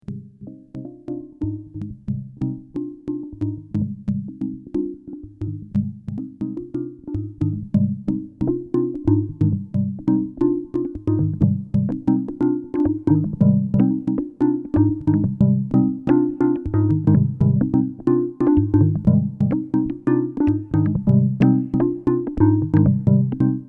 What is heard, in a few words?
Dub